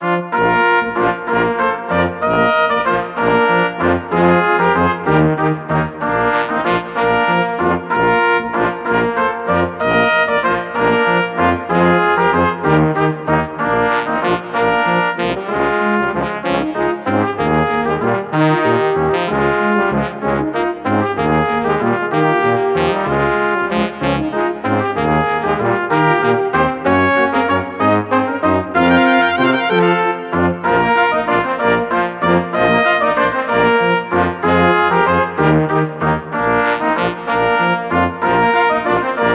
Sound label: Harvest Festival Brass loop